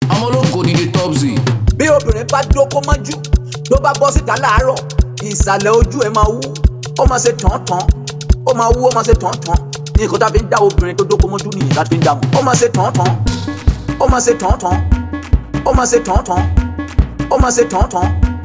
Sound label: Ton Ton Beat